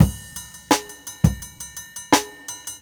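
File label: Half Time, Jungle Breaks, 170 bpm; Original Jungle Breaks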